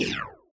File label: laser, shoot; jsr-clone; tefek.srclone